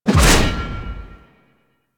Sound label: Melee Heavy Attack Large Whoosh; Warframe; Weapons; Whooshes; Heavy Attacks